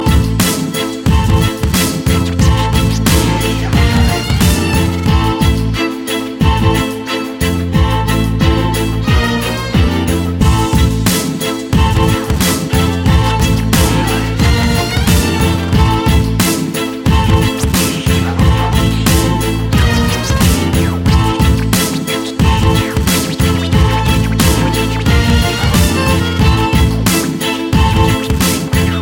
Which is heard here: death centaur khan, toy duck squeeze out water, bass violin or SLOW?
bass violin